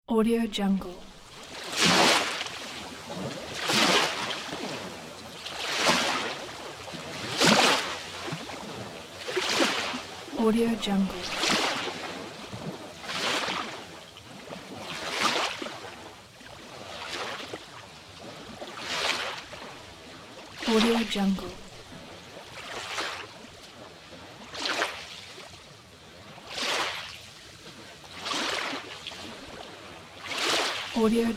night sea shore

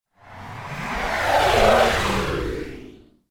cosmic swirl whoosh; fxprosound

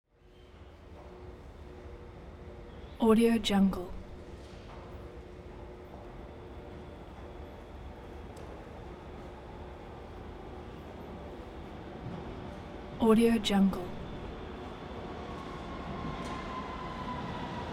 transportation train arrival train station slow

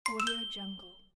complete

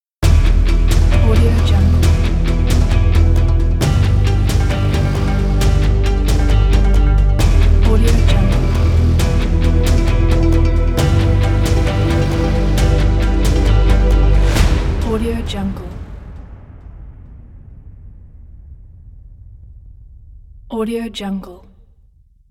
sports logo

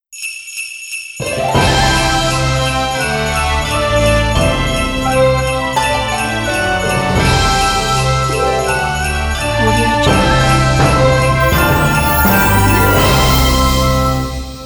christmas greetings logo

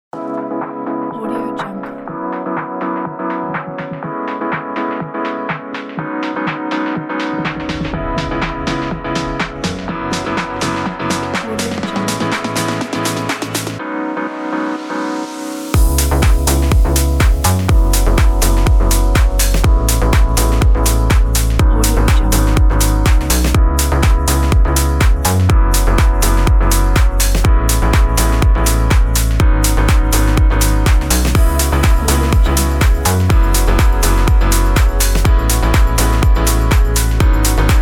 deep house

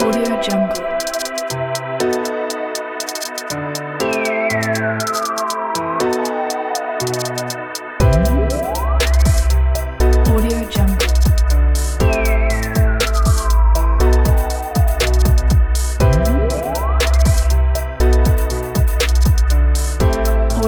experimental vibe hip hop; michael x studio

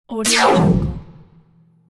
laser

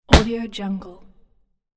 catch baseball

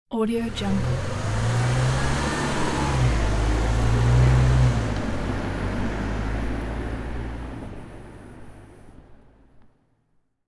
bus departure from distance

